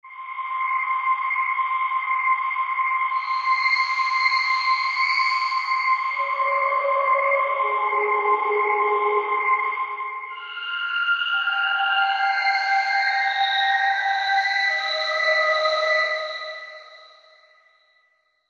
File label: Cikadasss, Percussion